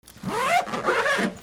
RIPPING

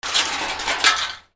BREAKING

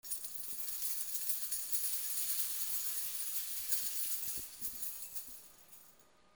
BANGS

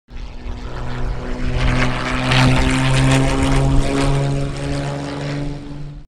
flight

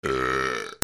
CARTOON

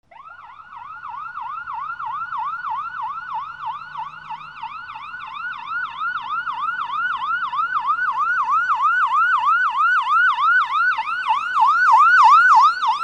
VEHICLES

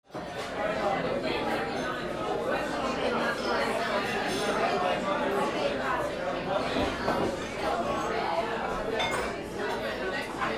AMBIENCE

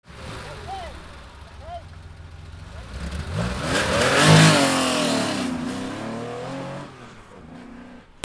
CARS

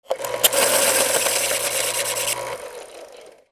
OFFICE

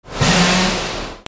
INDUSTRY

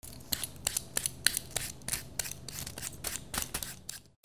KITCHEN

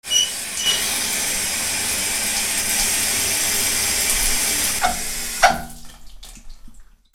BATHROOM

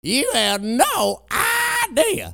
PEOPLE

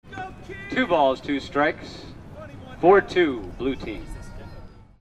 GAMES

